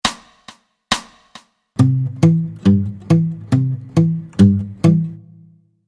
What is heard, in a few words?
Travis Picking